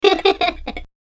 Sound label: Giggle